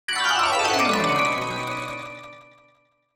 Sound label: Descending mallet percussion delay; BLASTWAVEFX